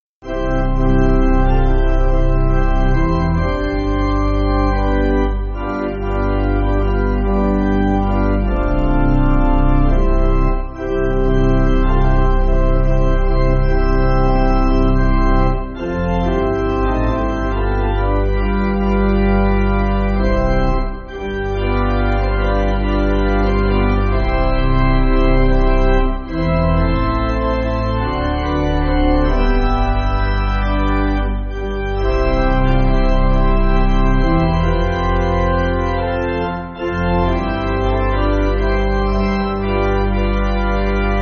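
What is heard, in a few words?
Pipe Organ, Free Music Backing Files for Churches; Jesu Wise Leader; Sankey; I.D. Sankey 9.9.9.9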